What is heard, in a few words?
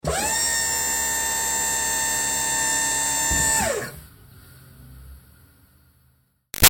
Servo Moving Truck Med Air Release; Motors; Machines; BigRoomSound